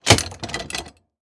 Foley Lock Break Metal

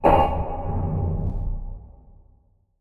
Transition Hit Impact Drone; Production Element

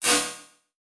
Multimedia Internet CD-Rom Flash Ding

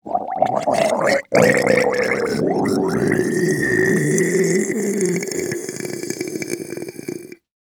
Horror Water Creature Gurgling

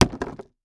Impact Debris Large Plastic Hit By Plastic Tube; Impacts

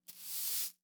Household Broom Sweep

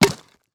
Impact Wood Chop

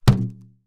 Cartoon Balloon Hit Hand Hit Single; AdobeCC

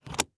Industry Hatch Plastic Opening

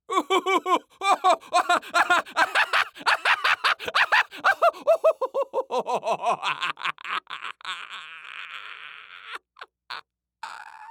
Human Male Laughing

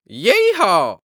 Human Male Yee-Haw

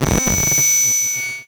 Transition Electrical Distortion; Production Element Title Transition

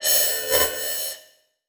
Flash Whoosh Multiple; Multimedia Internet CD-Rom Flash

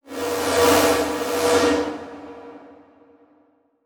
Transition Whoosh Multiple; Production Element; Title Transition; AdobeCC

